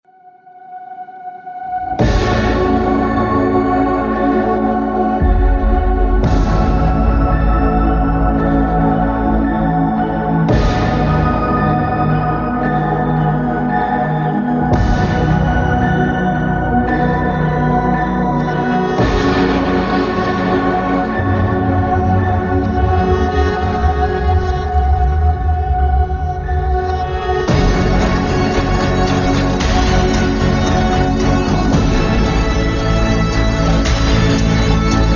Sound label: fights bgm; tamil; mafia chapter 1; cat and mouse